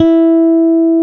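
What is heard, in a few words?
PEAKS BASS E3; PEAKS BS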